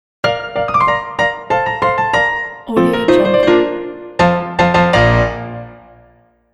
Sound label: piano hello logo; ok4